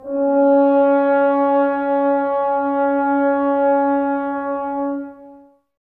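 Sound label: Brass French Horns, Woodwind Brass Ensemble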